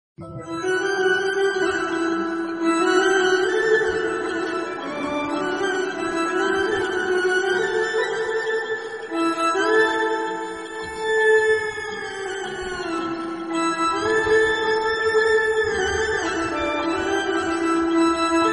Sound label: sad bgm tamil; mugamoodi